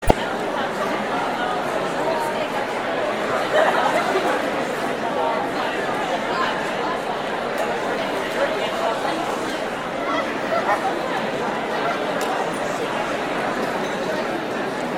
Tieng nguoi on ao o noi cong cong

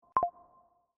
am thanh thong bao ios 17

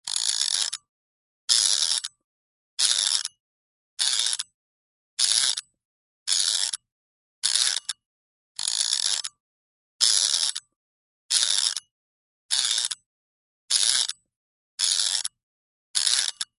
tieng len day cot dong ho

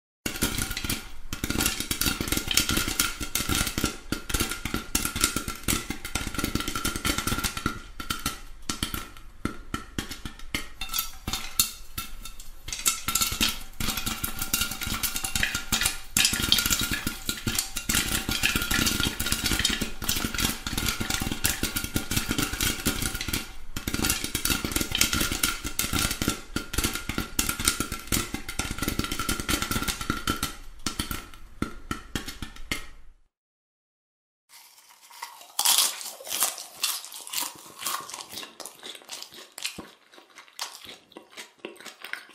Tieng rang bong ngo no va tieng nhai bong ngo

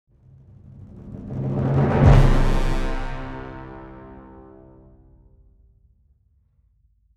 Tieng trong nguy hiem

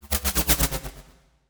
Âm thanh ảo thuật biến hóa

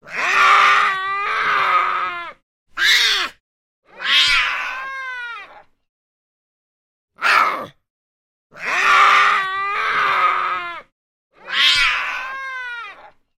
Tiếng con hổ con kêu

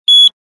tieng bip ngan

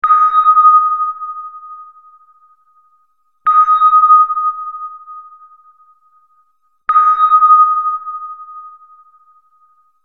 Am thanh ping

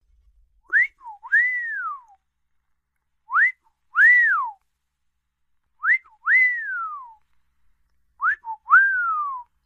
tong hop tieng nguoi huyt sao huyt gio

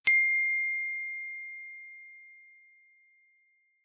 Nhạc chuông tin nhắn ngắn

